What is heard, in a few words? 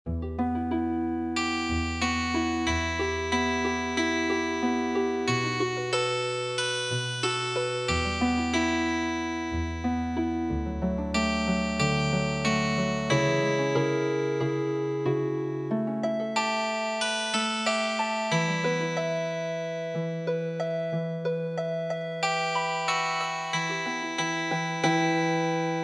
Healing Harp; East Virginia